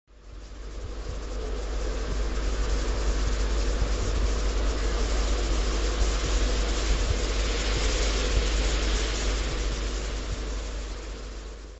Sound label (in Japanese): 風の音; dead end junction windows gamerip 2016